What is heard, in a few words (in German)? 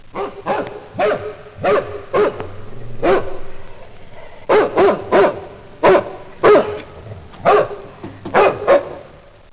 hund